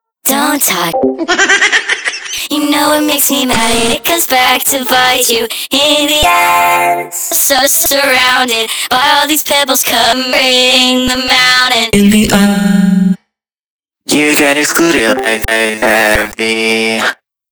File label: vocals